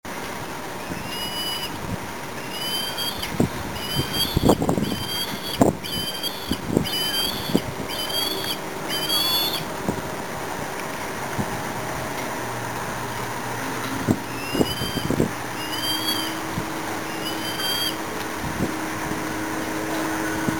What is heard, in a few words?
Pandion Haliaetus Cristatus; Eastern Osprey; Pandionidae (Osprey); Family - Pandionidae (Osprey)